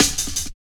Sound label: Fat Rolla Cut, Jungle Drum Loops; Zero-G Jungle Warfare 1, 2 & 3